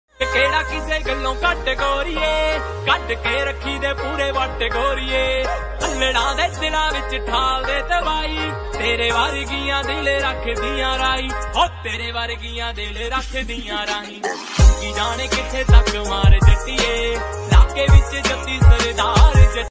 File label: DJ Punjabi Ringtone